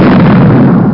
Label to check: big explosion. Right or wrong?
Right